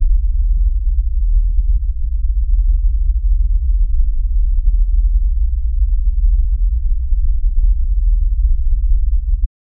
intermittent buzzing of a, underwater

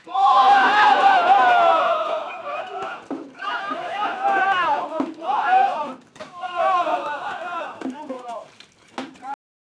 chaotic night fight sound, drumming

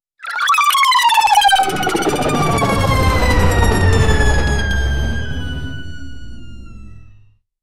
bright magical evolution, power up

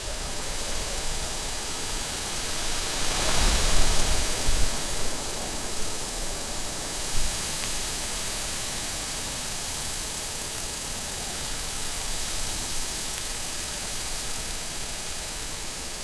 mountain sound little win

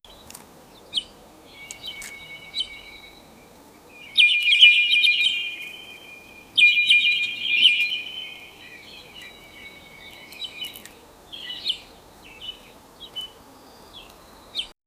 birds chirping sound of, morning

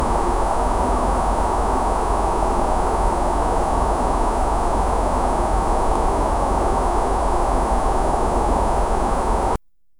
mp5 9mm single shot dry, gunshot

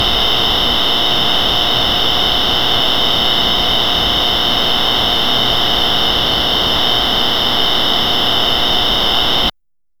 an old fluorescent bulb; electrical

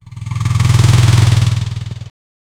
the imposing of sound, supercar

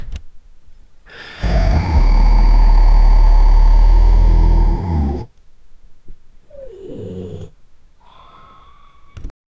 a tyrannosaur snoring; dinosaur

